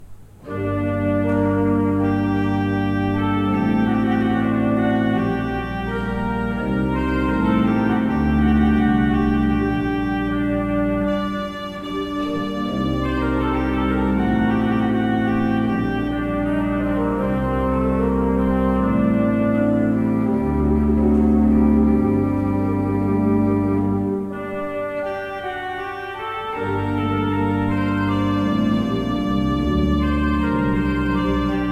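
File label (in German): Orgel, pfeifenorgeln; Seifert Kevelaer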